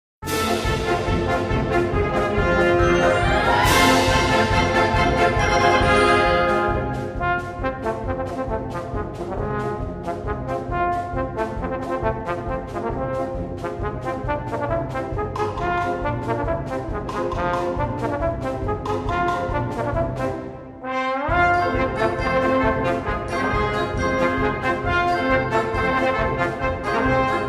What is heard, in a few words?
dazzling trombone